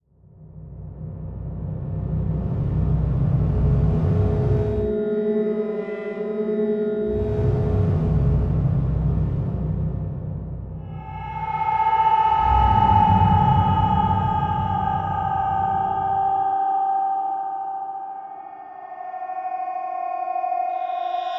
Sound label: Electronic Whoosh Reverse Feedback Digital; Adobe; Production Element; Imaging